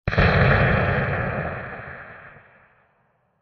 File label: Huge Bright And Crunchy Explosion; Explosions; Crafted Signals